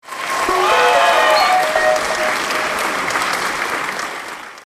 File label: game win